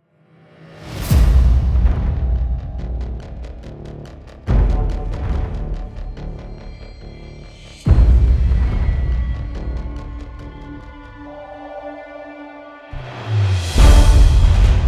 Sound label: Various Jingles; METAL GEAR SURVIVE ''CO-OP Mode''